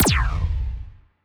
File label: anti fire rate laser muzzle, weapons; biogenesis